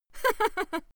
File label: female laugh short